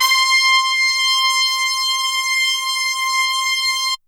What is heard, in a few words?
HORNS C5 R